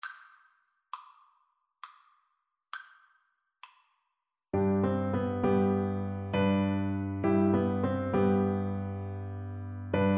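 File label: blessed assurance, vibraphone; Percussion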